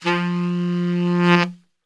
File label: F 2 SAX, Brass Super Section; Best Service